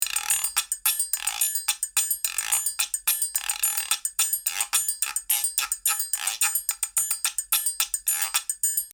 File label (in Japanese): トライアングル＆ギロ